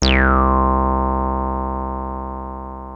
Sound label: 303 C2, TB 303